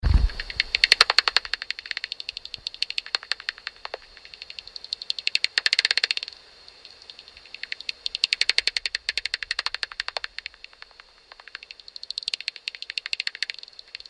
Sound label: common pipistrelle with ultrasonic bat detector; in milton keynes